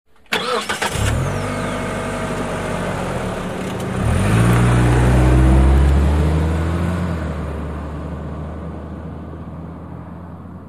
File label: Sedan Accele Med Spd